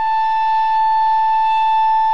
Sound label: sax a5